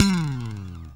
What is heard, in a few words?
BS, E.Bass FX; Roland L-CD701; Jazz Bass